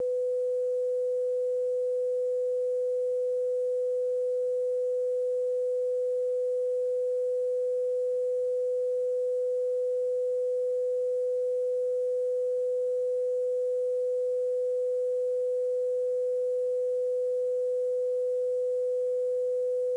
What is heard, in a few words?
16 bit type2 ultra, dither